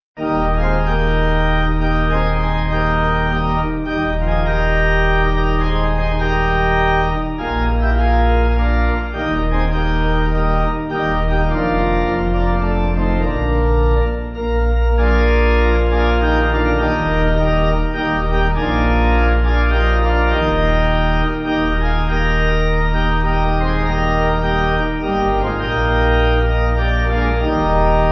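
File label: Pipe organ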